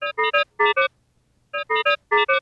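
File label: Ventilation Emergency, alarms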